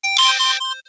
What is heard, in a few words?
Camcorder Stop; Samsung; GT-I7110 Pilot